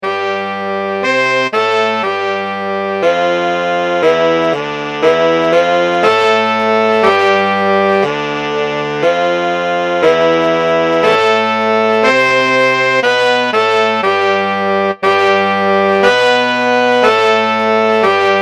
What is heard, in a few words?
horns, hymn